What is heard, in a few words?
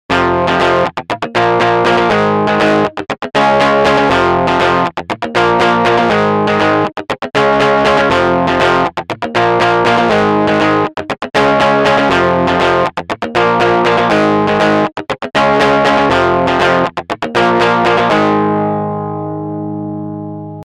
Guitarist